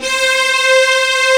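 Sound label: D70 warm strings; East West; Global Sounds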